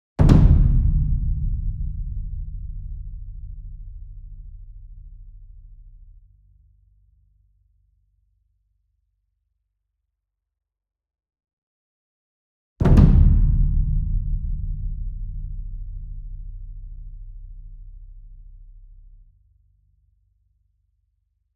ENSEMBLE DRUM Bass Drum Concert And Taiko Flam Near; boom library; Cinematic Strikes Construction Kit